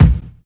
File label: round steel kick drum a sharp key; kick drum samples